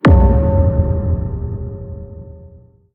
emergency meeting sound after button; among us

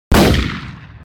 piggy gun shot; willow

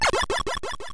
teleport sound; nes

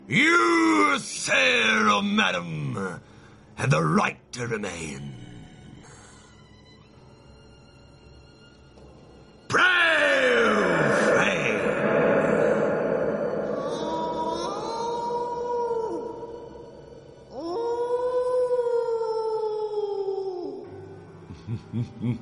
evil laught

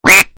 quack

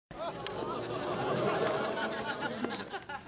uh oh laugh track; disney channel

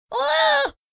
bubble scream; bfdi

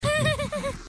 nervous laugh

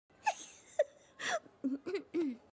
laughing; penny fitzgerald

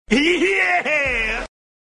yeeheeaaahh

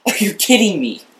kidding me laugh

